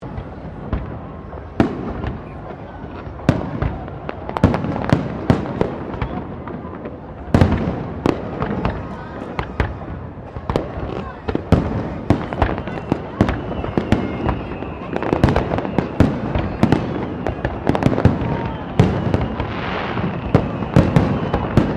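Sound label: Ambience New Year's Eve Melbourne Crowd Fireworks; New Year's Eve 2008 Fireworks; Firework Sound Effects; Foundation1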